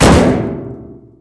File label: metal barrel impact hard; physics